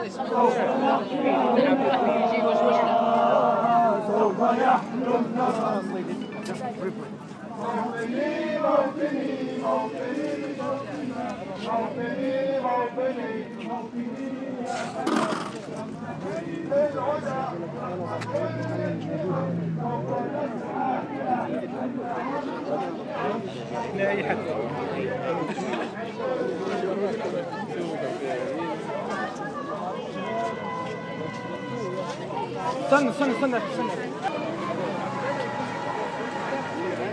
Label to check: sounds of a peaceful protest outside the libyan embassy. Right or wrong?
Right